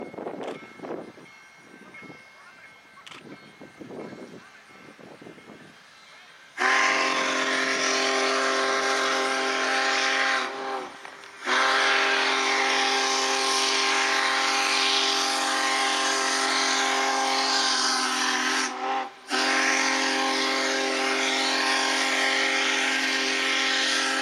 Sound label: whistle